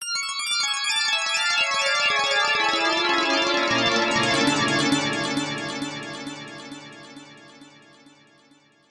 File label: arpeggios arpeggio; zasfx